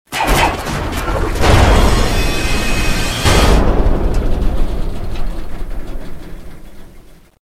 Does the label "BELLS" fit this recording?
No